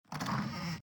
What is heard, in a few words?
chest open; baldursgate